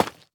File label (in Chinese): 凝灰岩砖放置声